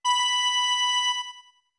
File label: Notes Music Box B long; OOT